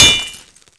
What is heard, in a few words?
wrench hit build fail; tf2